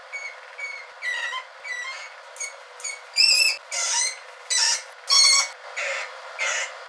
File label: Gerbillus perpallidus; The calls of a defending male during the encounter with a conspecific male; Order Rodents (Rodentia)